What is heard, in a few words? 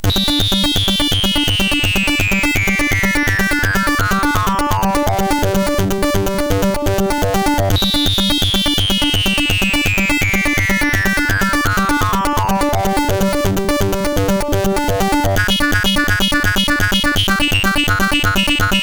Clean Ring, sid MOS6581R4; Morton Adam